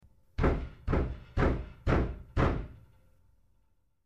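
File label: Rapid Knocking On Wood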